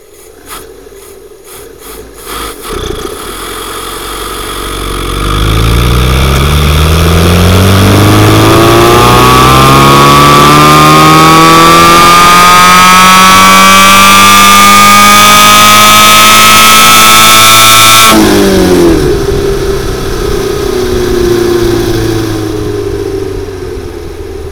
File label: 0 100 takeoff 2nd